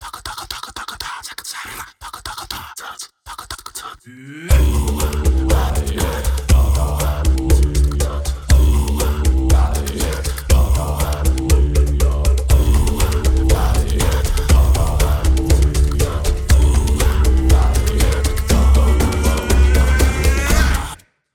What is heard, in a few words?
teaser music